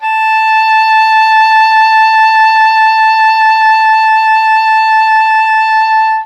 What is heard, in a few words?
EH A5, ENGLISH HORN; Q Up Arts; Denny Jaeger Private Collection